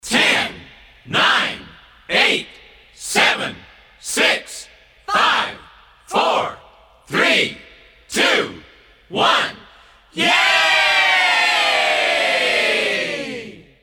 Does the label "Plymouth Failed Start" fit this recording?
No